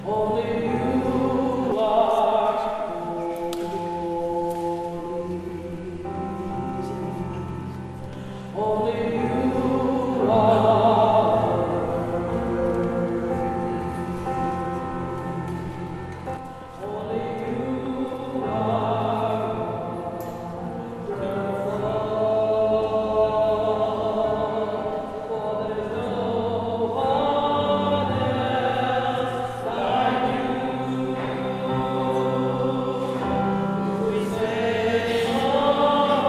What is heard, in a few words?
Tenor